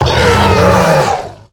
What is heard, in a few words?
Bronze Herald Death